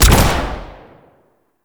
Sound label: Rifle Shot; Warframe; Weapons; Zariman Rifle